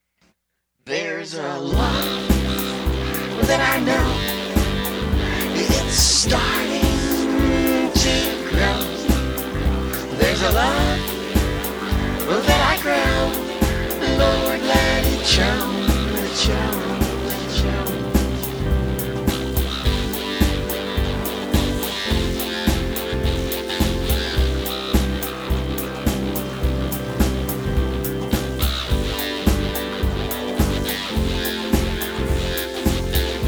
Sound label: Psychedelia Rock Music